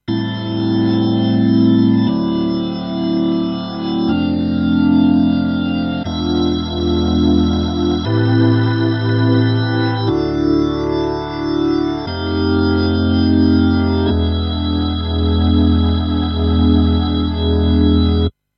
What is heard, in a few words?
E Organ; EHX Lester K